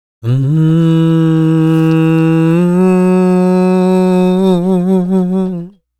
African Vocal Drone; Continental Drift Aftershocks; Vocals

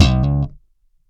DOPE PLUCK; E-MU Formula 4000 Series Vol. 1; Hip Hop Nation; Big Bottoms

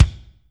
DANCE SET, DRUMS

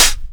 HH CLOSED; AKAI; USB Soundscan vol.20 - Fresh Disco House I

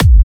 Electro Kicks

